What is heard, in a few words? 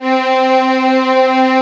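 Vio legato C3; Digital Sound Factory Virtuoso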